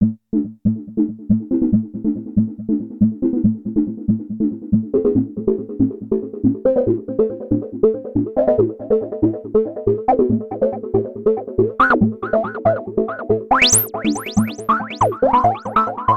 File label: ensoniq sq-80 sounds, super resonance external fx